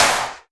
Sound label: Claps; Vengeance Samples